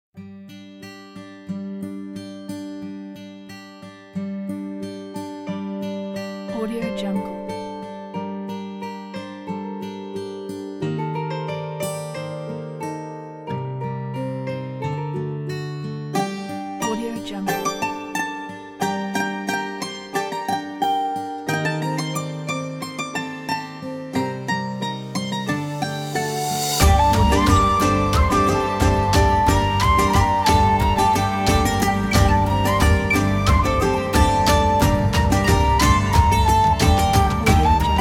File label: middle ages adventure